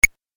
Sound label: Retro Pong Tennis Hit; Video Game Sound Effects